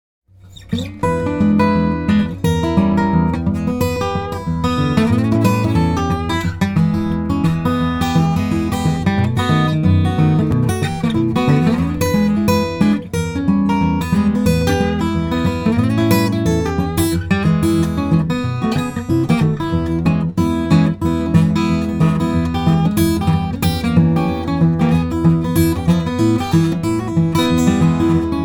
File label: Deep River Blues; PIEDMONT BLUES; GUITAR